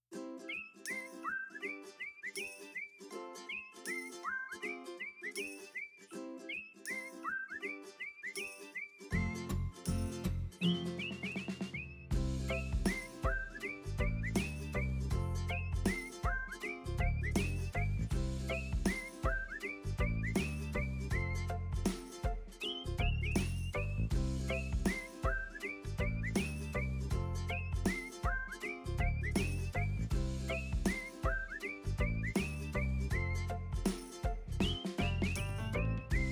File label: WHISTLE